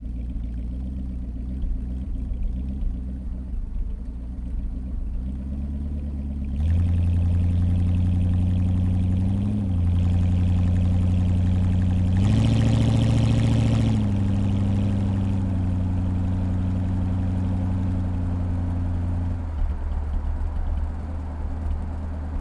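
dodge a100 onboard medium drive decelerate stop off exhaust mix; Sonniss GDC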